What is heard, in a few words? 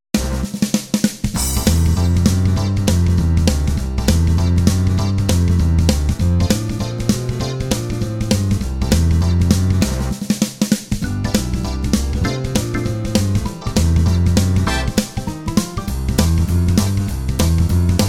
Real Shuffle; wersi